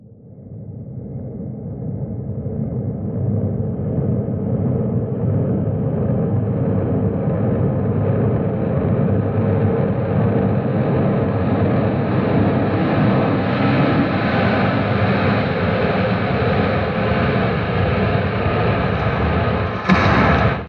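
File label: Reverse Long Master Filter, Cinematic Metal Impacts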